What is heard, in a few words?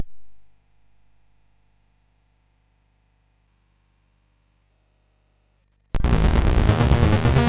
Moog Swing, MOS6581R2